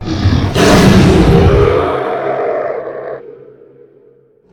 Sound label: roar, dragons; combat; CosmicRage; CosmicRageSounds; chickens and more chickens